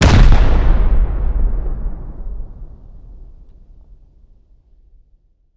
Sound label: rocket explosion; Rust